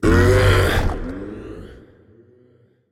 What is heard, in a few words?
"ugh"; smite; sylvanus